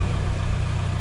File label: engine; idle miners